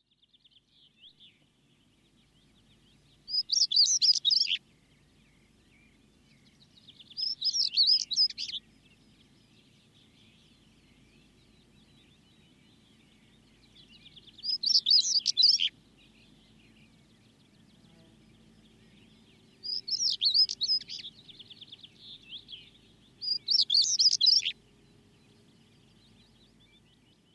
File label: Stonechat